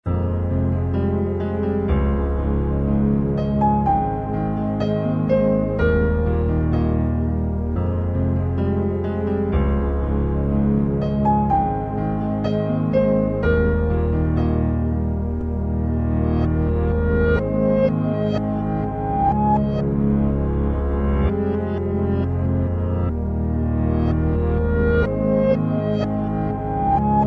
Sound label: piano synth